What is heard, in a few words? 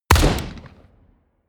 shoot close